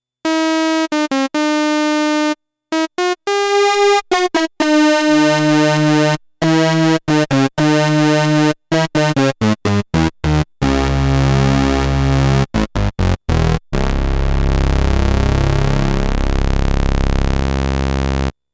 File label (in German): Alle Oszillatoren zusammen